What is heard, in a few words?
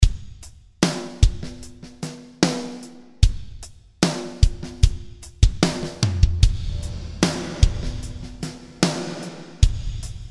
Drums Room Size